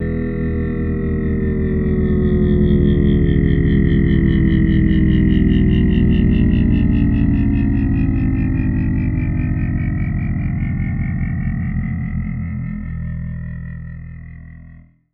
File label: TUGBOAT; AKAI Anime Sound FX; FLYOBJECTS